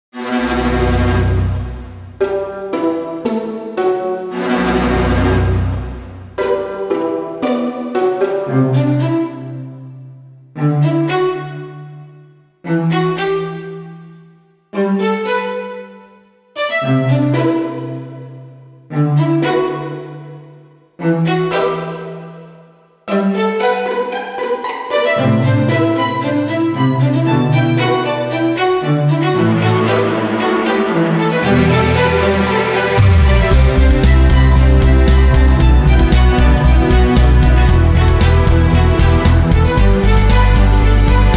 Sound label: bass